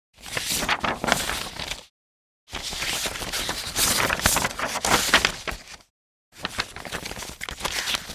Paper shuffling and organizing office supplies

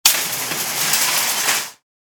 Shopping cart return clatter

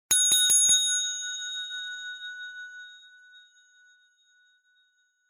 Desk or service bell ring x4

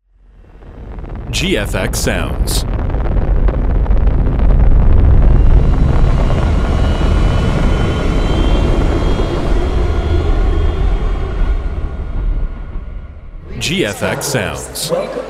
futuristic ambiences; futuristic ambiences sfx pack

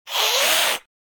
Vampire hiss monster aggressive

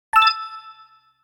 Positive twinkle accent

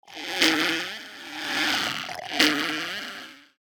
Mouth sucking squeaks; misc human

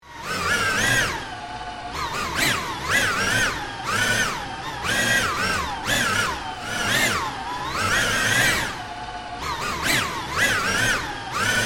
Buzzing drone flying movement noise

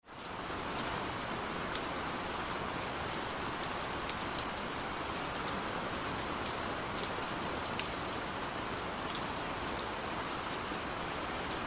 Heavy rain falling in city night ambience

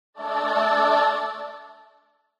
Level up angels choir

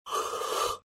Yawning inhaling and exhaling

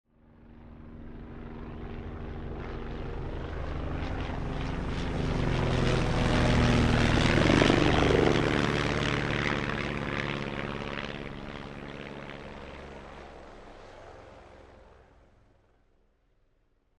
Light aircraft fast overhead passby

